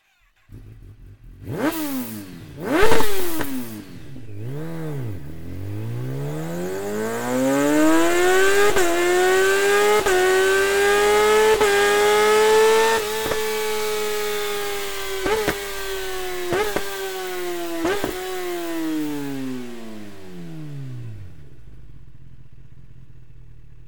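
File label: slip on wo insert, exhaust; akrapovic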